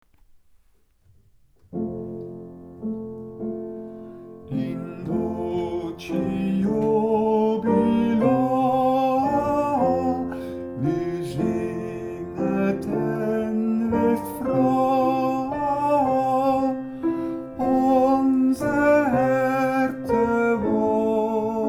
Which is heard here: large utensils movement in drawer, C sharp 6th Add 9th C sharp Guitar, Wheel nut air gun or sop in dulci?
sop in dulci